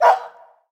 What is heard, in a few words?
Dog Bark; City Amb